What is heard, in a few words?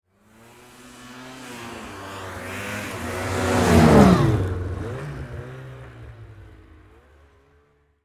Snowmobile By, Snowmobile, Pass, By, Close, Fast, Speed, Yamaha, Exciter, 440; Sports; BlastWave